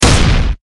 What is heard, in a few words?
Battle Char Landing; MilkChoco